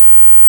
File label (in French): son 18000